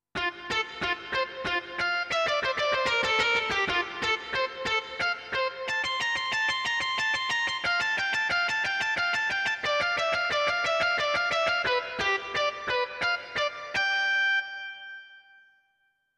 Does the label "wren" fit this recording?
No